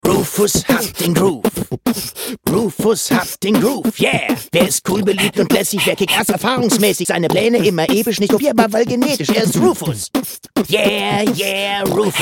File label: Rap; DEPONIA; Rufus